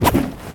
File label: Yoink Stealing Sound Effect